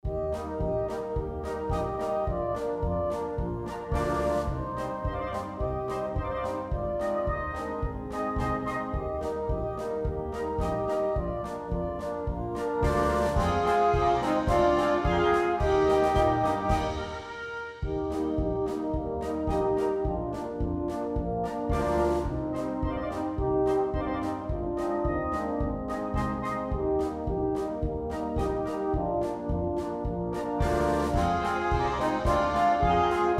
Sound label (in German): Glocken Polka